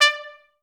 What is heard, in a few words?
STAC D4, Orchestra; Best Service; Peter Siedlaczek's Advanced Orchestra